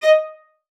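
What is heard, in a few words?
CELLO spiccato D#5; Xsample VOL-5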